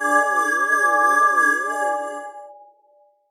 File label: enemy stare; void Blinker